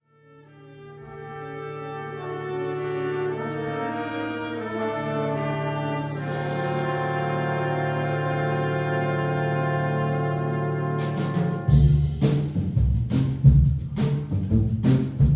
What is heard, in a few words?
Iraqi Blues